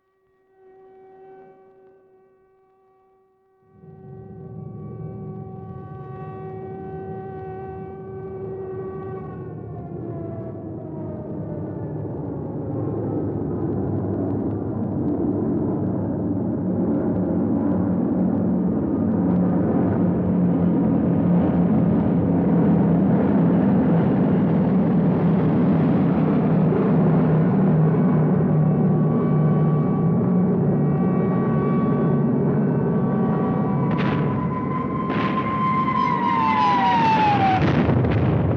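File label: Bombing, dropping bombs with a whistle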